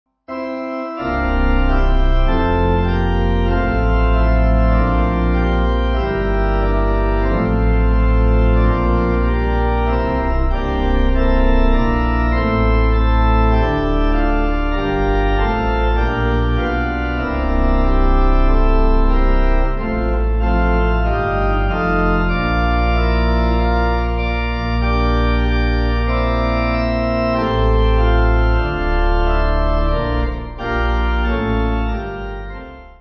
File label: Where Shepherds Lately Manger Song Pipe